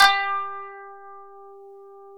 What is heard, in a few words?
KANUN G4; SoundBytes; Early Patches; KANUN SMAL